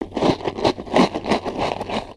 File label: Pet Eating; creatures pets